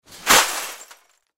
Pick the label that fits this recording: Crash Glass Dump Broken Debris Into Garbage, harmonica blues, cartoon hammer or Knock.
Crash Glass Dump Broken Debris Into Garbage